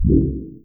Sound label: portal; yamaha 2022